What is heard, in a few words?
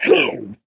jump; Portal 2; Source Engine; video games; ballbot